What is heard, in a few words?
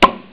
Click; VAUX-Killer